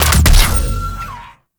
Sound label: Grenade Launch Start; Vehicles; Abilities; Warframe; Nechro Mech